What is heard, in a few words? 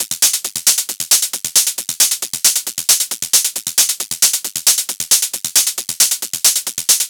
Hat Loop 135, Techno